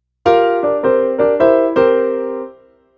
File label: Piano Phrase